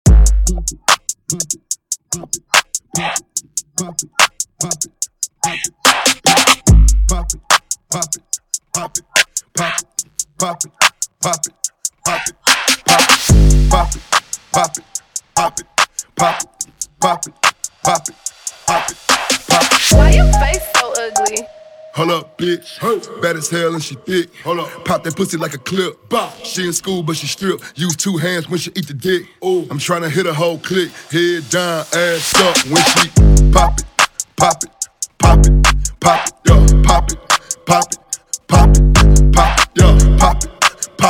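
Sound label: Acapella Breakdown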